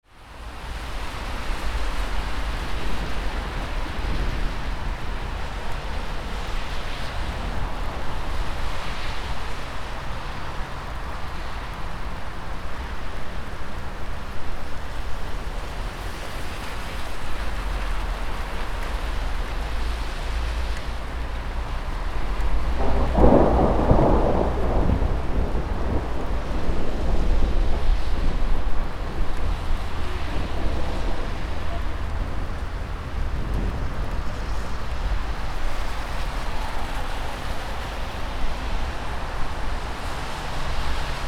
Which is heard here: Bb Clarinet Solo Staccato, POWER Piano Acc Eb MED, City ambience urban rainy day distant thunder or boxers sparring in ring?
City ambience urban rainy day distant thunder